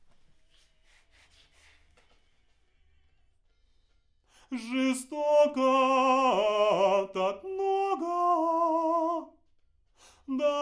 Backs AKG RIGHT

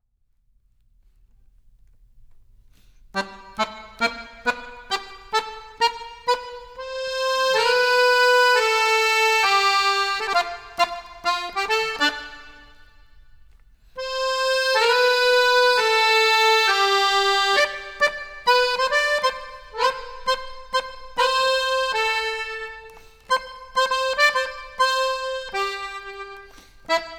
Accordion, Jazz for piano

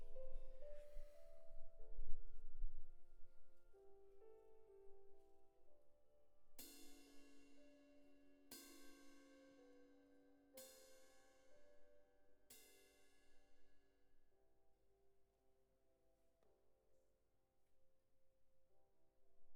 Cymb Close

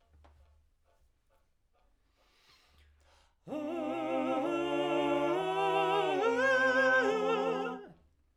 Backs SCHOEPS

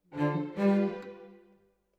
Cello Solo

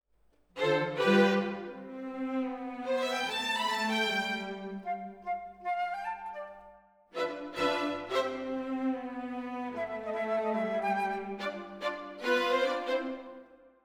Orchetra, Jazz for piano

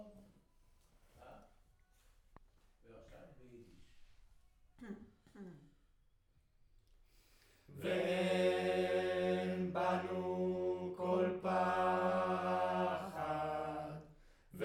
Room, Choir

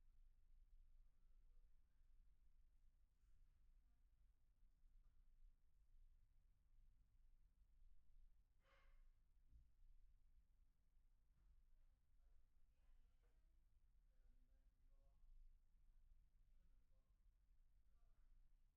Close, Choir

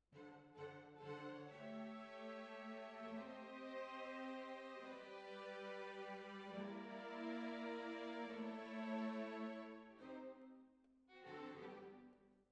Clarinet

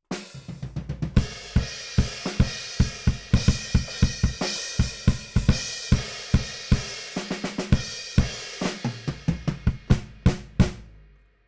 BD Out

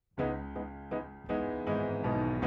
Piano B; Piano Workshop Rec

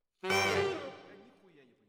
ORTF SCHOEPS, piano

